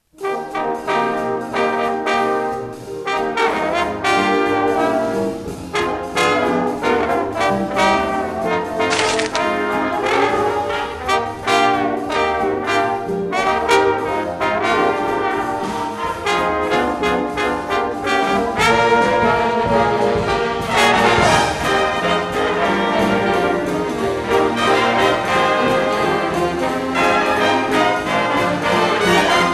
Jingle Bells, BigBand

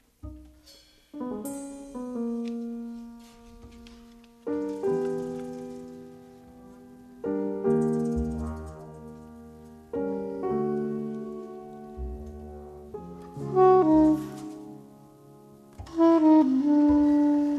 Band Interlude

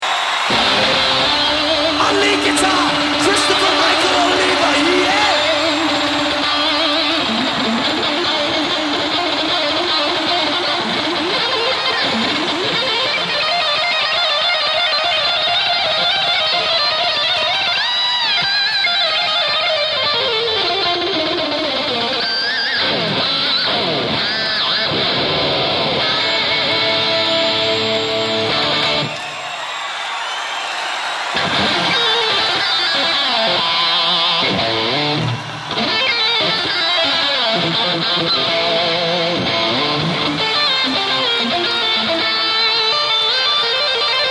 Guitar Solo

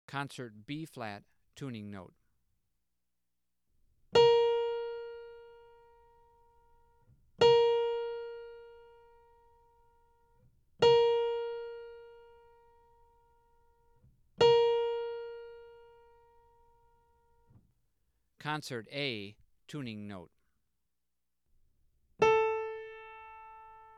Tuning Notes; James „Jamey“ Aebersold Play-Alongs

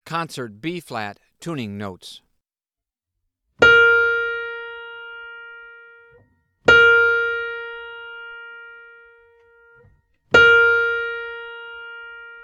Tuning Notes; James „Jamey“ Aebersold Play-Alongs

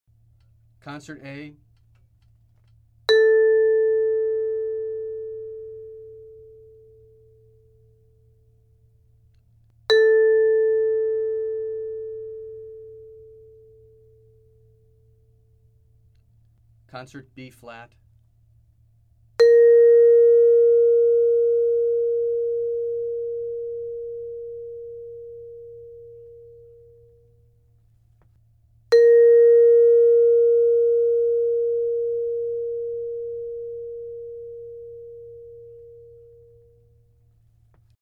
Tuning Notes

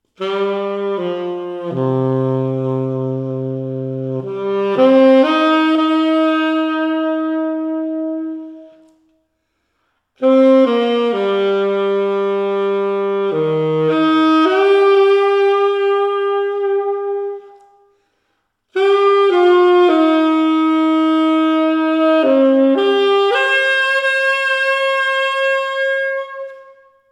Fanfare; Nils Thoma Eigenaufnahmen (Reha-Klinik, Bad Schandau)

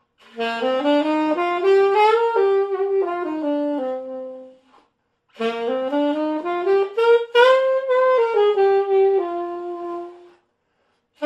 Alto Sax; Nils Thoma Test Alto Sax-Mundstücke (Castle, Saarburg)

